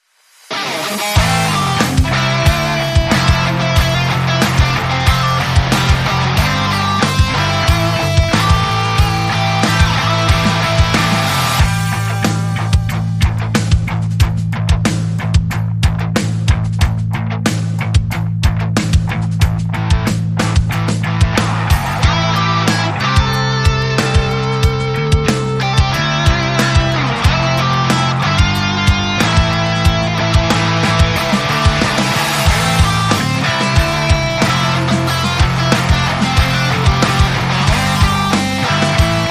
Hard Rock Strut

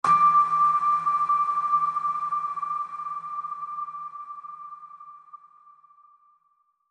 Hushed Gentle Ping

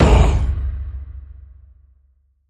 Vocal Fury Viking Hit

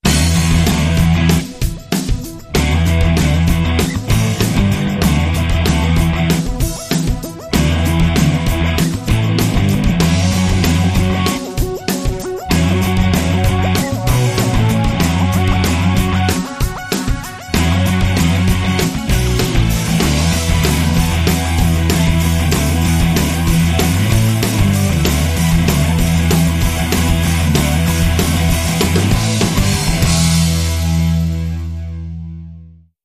Rock

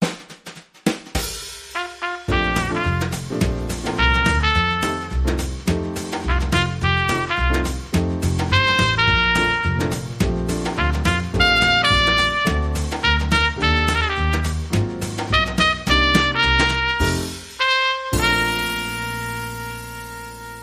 Trumpet Swing Happy Birthday

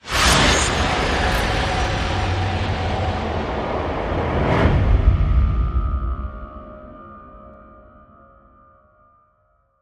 Pressurized Vacuum

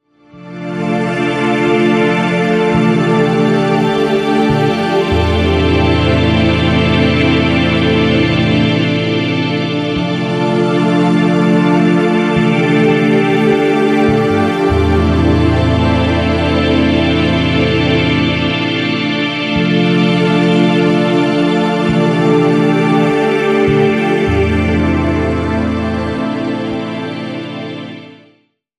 Space Bass

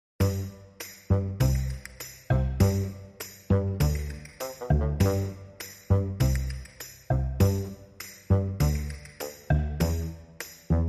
Drums Bass and Bassoon Only

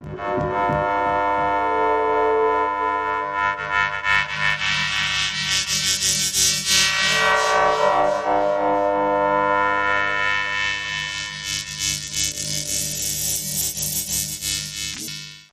Musical Pulsers

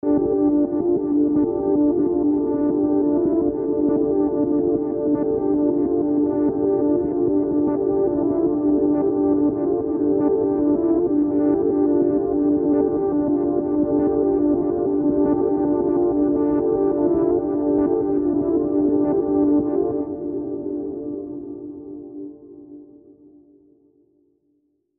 Illuminate Pulse

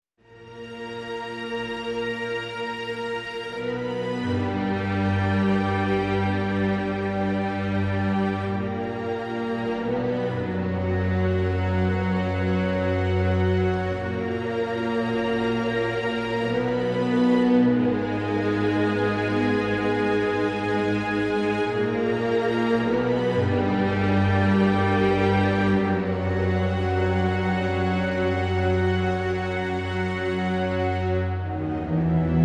Strings Melody

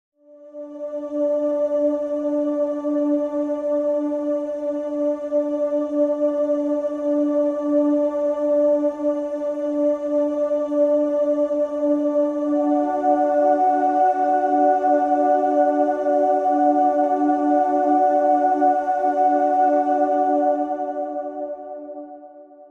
Happy Layer

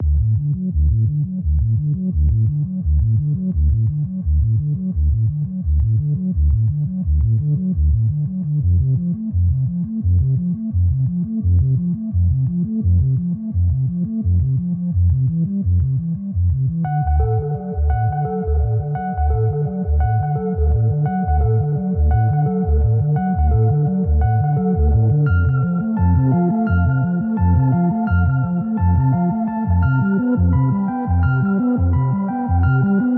Bass High Note

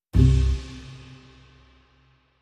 Drum Loop Ending

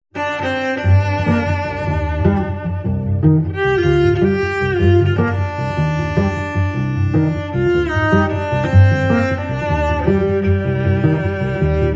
Cello Suite 2 Variation 3

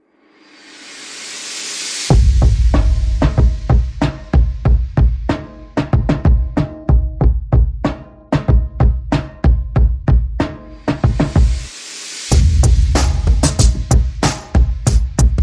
Pizzy, Perc and FX

